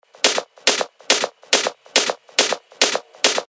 techno loop 140 BPM; Egghead; revealed techno top loops vol 8

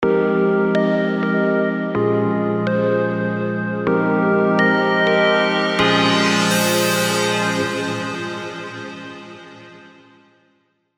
Aqua Whispers, keys; revealed diva keys vol 2

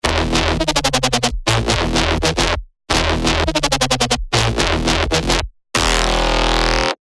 Reese, Serum 2; Reese-naissance Man; revealed serum 2 reese renaissance

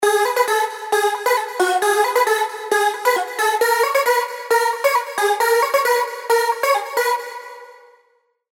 Serum arps; Nu Tech; revealed serum arps vol 1

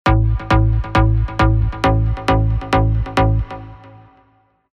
Offbeat Hardbass; Spire; revealed spire techno bass vol 1

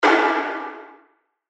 percussion; Cadet; revealed percussion vol 3

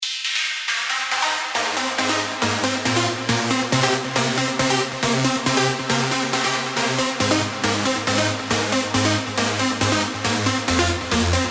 trance leads; revealed spire trance leads vol 1; Astral Pulse

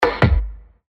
G#, percussion; revealed percussion vol 1

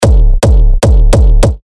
Kick C; Begin; Revealed Terra Kicks

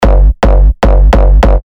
Kick G; Anu; terra kicks; Revealed Terra Kicks

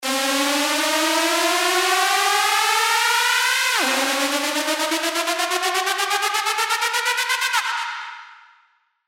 FX Detuned Riser; revealed serum 2 bass tech house vol 1

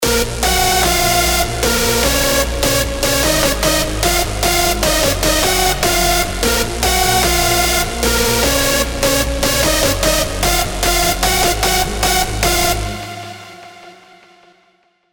Hardstyle Melodies A# WET 150 BPM; revealed hardstyle melodies vol 2

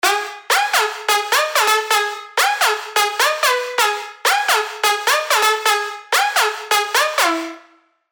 dirty dutch leads; Spire; Pijnlijk Lekker; revealed spire dirty dutch leads vol 1

